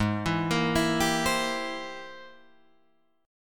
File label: G sharp Augmented Major 9th G sharp Guitar Open D